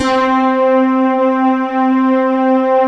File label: LA SYNTH